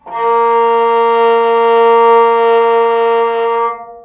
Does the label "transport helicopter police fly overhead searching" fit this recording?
No